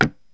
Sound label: move